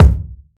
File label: punchy kickdrum sound b key